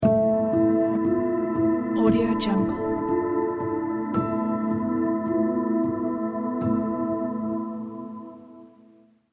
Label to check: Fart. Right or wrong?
Wrong